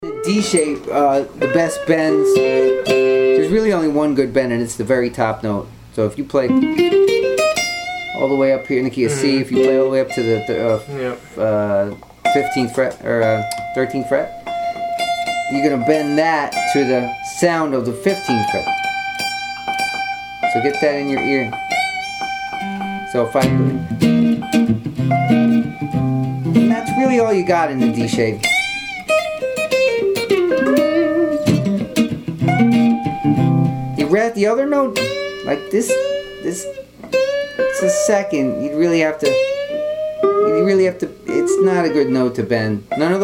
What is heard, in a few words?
bends d shape